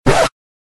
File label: miss note